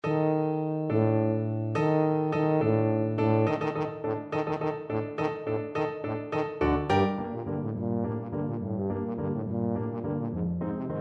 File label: danse, tuba